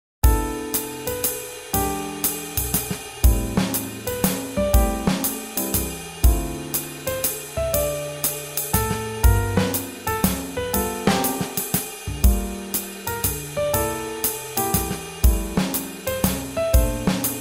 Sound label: Swing Jazz